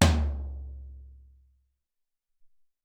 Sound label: 16 Tom, Alesis SR-18